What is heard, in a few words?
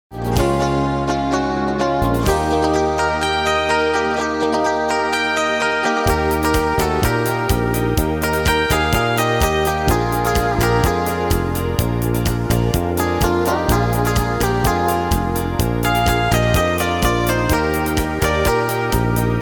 guitar; Lovers guitar